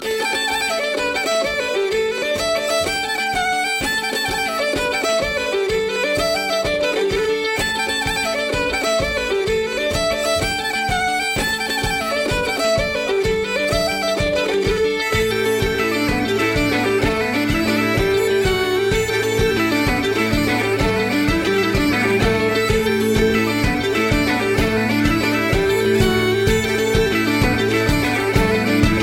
Music Box

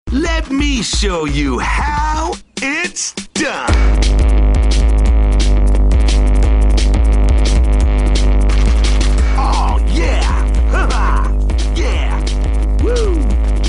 Bass; Adventure Time

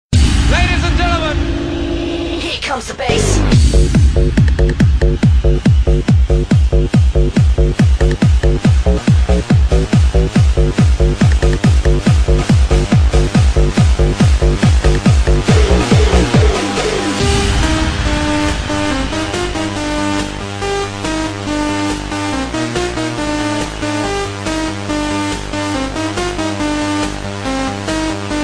electro Bass

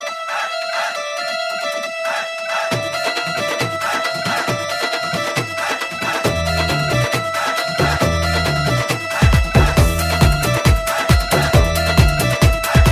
Punjabi Hindi Music

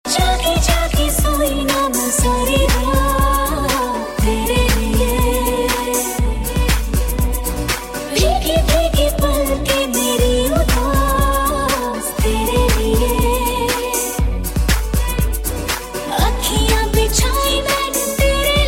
Hip Pop

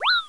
Hit; OSRS Hit 2

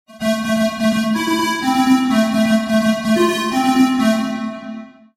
Electra Ring

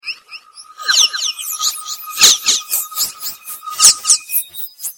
Alien Noises

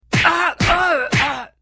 Punch

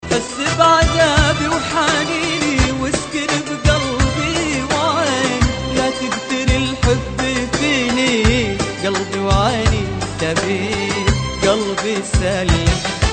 Arabi Love Song

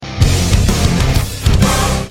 Heavy Guitar Intro

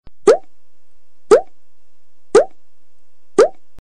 Drops

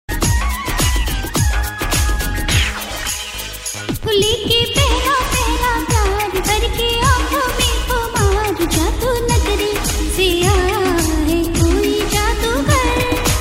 Funky Old Song

